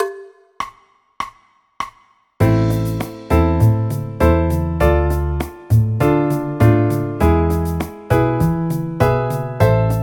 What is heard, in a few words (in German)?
a moll akkordverbindungen